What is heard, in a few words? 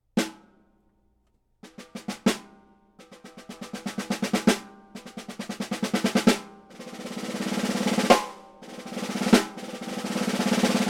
Sling COB Soundking JR 5x14, drum